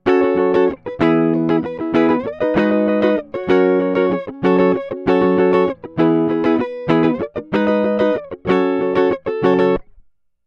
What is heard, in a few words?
Pattern without electronics, StockPickups